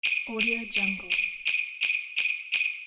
jingle bells loop

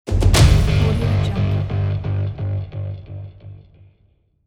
cinematic guitar impact

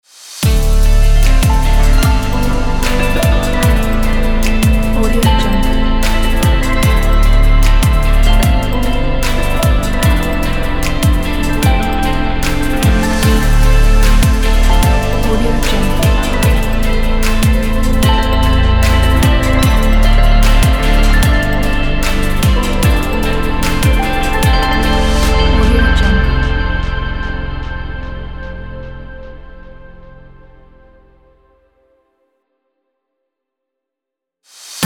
futuristic ambient modern logo

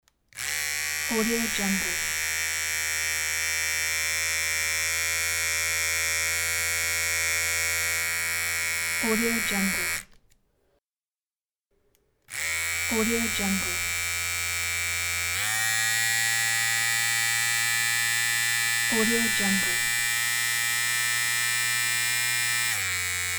beard trimmer

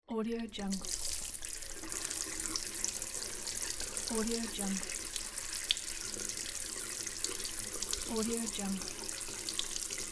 wash basin tap water flow

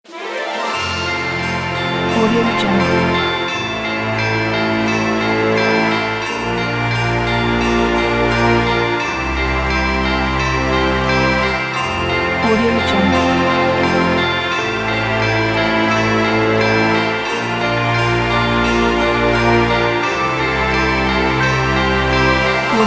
reindeers in town

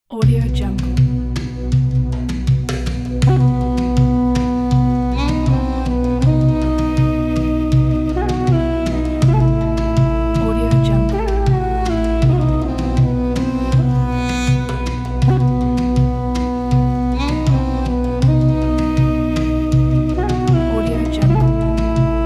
hava nagila duduk

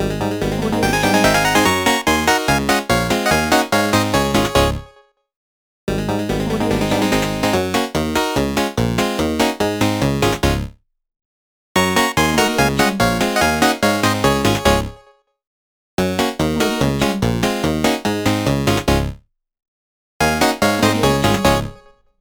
chiptune videogame logo; jigsound

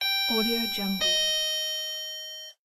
doorbell

